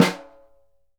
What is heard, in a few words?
SNR, Synclavier Percussion 2 - World & Orchestral